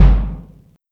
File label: Bam; osu